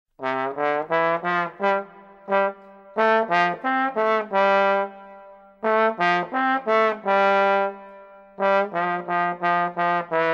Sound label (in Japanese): トロンボーン